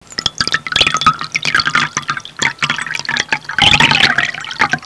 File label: WATER IN